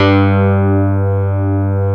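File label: DX synth keyboard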